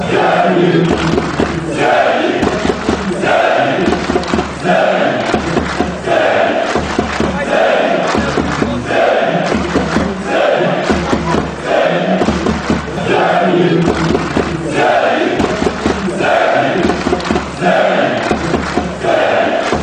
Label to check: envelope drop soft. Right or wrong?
Wrong